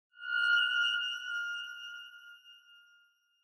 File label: Horror Accent Short